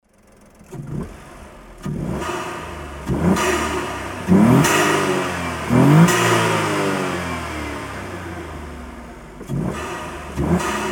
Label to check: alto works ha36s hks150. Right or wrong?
Right